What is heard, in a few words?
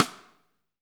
SIDESTICKS; Spectrasonics - Backbeat